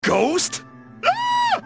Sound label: Ghost Scream; Ragna